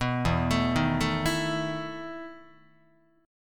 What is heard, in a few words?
E Major 7th Suspended 4th E down Guitar; EM7sus4 arpeggiated